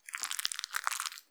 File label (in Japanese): ゆっくり 1 ストローク, ピストン運動; １ストローク単発